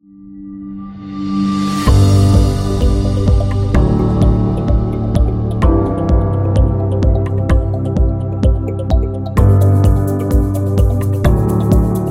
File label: Light Happy